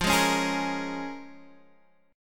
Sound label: Bb Minor 7th, guitar